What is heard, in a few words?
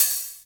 hi hat open, Korg ER-1; Kit 13